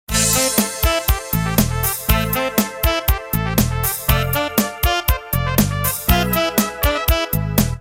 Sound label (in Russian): truba sms